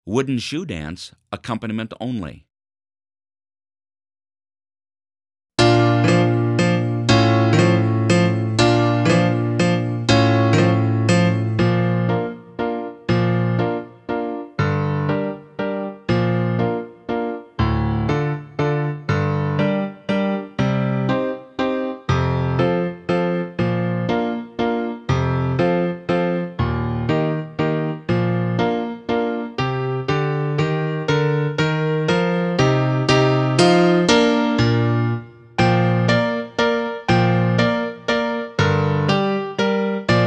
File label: wooden shoe dance - accompaniment only